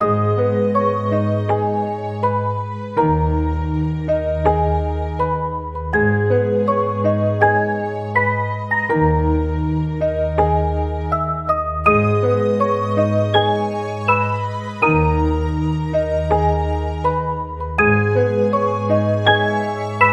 keys

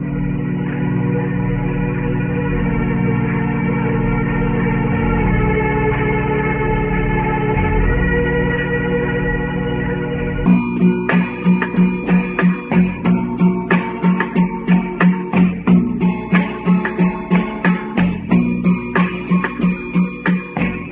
keys

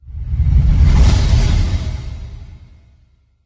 electric explosion; Crash Bang Boom

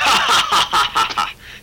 laugh kung fu; ooh ahh

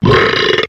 burp short

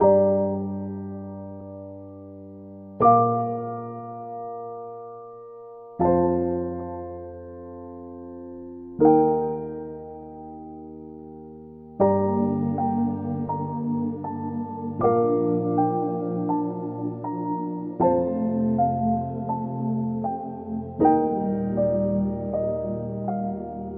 piano, Loops Sad

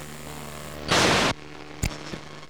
tuning

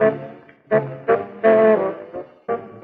horns spunky

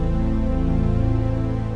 String

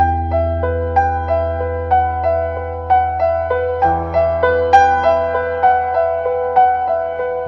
piano, Sad

